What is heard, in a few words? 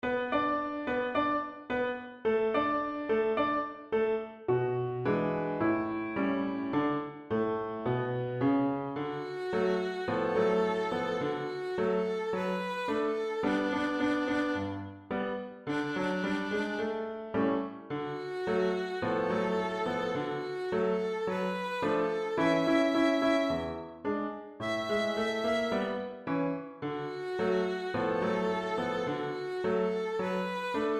back scratcher solo and accomp